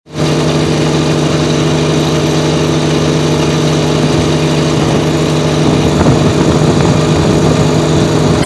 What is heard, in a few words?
lawn mower; oakdown holiday park